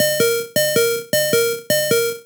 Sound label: In-Battle Health Low